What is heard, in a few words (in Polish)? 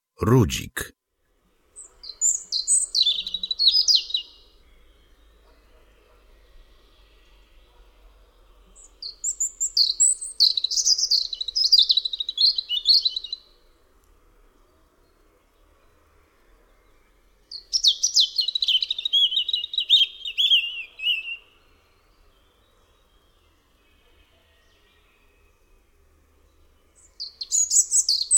RUDZIK